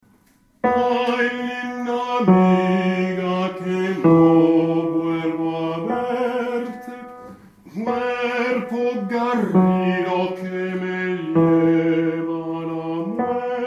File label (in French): Basses; Ay linda amiga